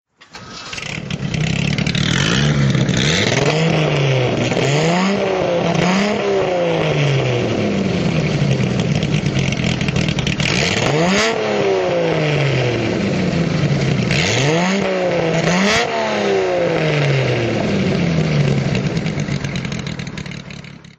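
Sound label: lister jaguar throttle, Engine sound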